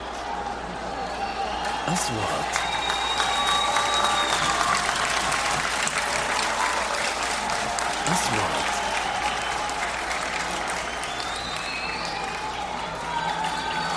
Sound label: Enter the players and the audience of a football field